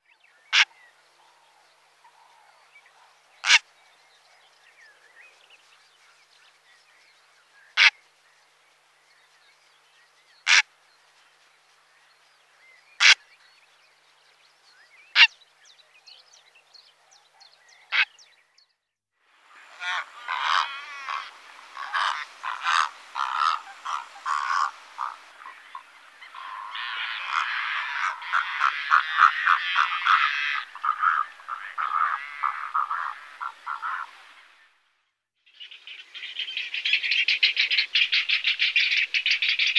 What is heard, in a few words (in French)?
heron pourpre son